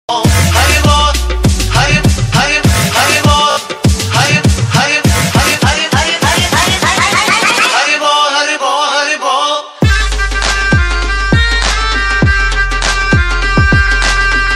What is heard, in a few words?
hari bol ringtone